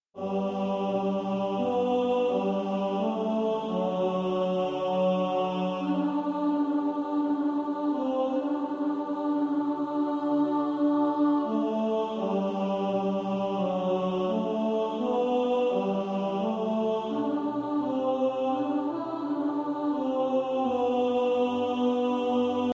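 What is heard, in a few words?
tenor, practice music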